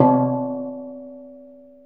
C2 DBL SECND, steel drums; AKAI S6000 CD-ROM; WorldSounds

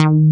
PRO1

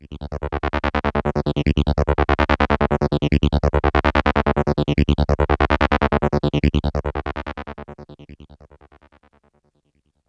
Synth; ZERO G - Datafile DISC 3

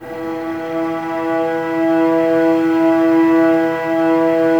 STR VAS E, Violas, No Vib; Miroslav Vitous

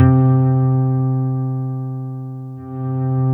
C3, SynthLine

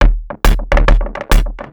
percussion loops, Techno; PS-45

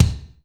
DANCE SET, DRUMS

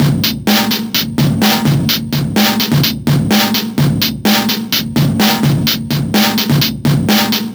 Live Breaks, 127

